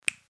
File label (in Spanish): Chasquido; Banco de Sonidos - Facultad de Art; Díaz - Márquez - Loustau